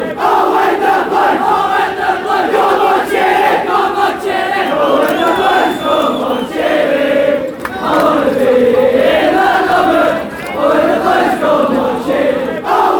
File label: ohh when the blues, fan chants